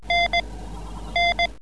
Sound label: beeps